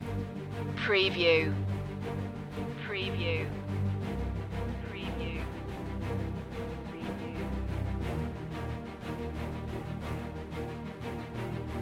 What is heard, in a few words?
no perc